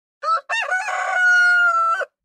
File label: rooster crow